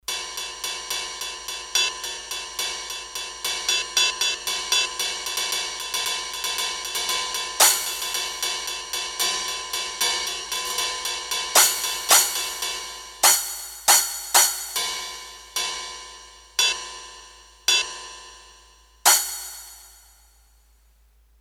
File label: Cymbal Special, Roland D-10; Factory Patches